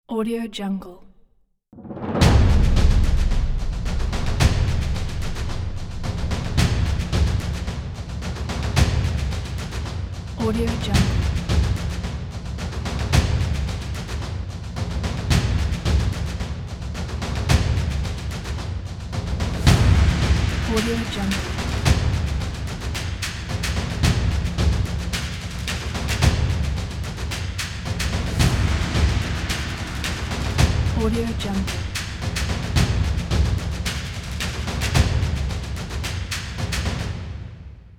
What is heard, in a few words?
orchestral percussion